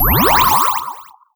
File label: Magic Shield